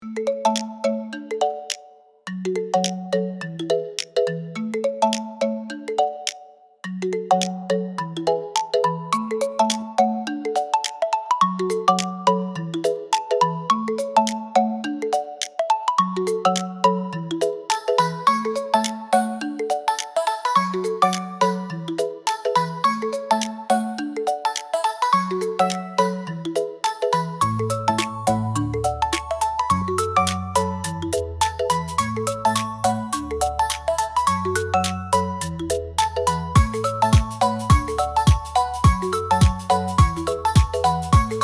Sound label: Tropical inspirational paradise rhythm